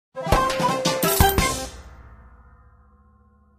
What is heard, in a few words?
Music Level Complete